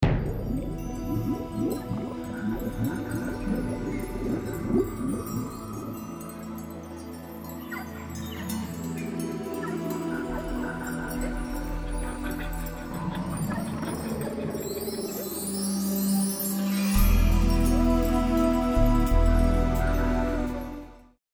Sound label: Level Music; Ecco The Tides of Time (CD)